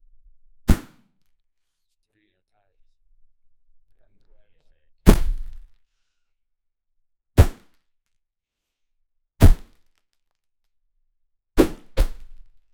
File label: human throws a ball, sports